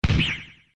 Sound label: bullet; mr i; Звуки из игры Марио